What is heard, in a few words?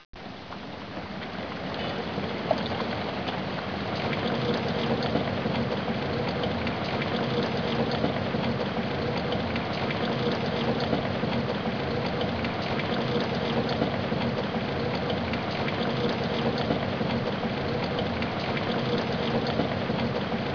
Rain, 雨声; 自然; 特酷声音素材库